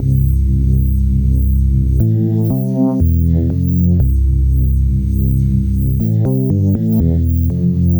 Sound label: Noisy Bass; Electro Dub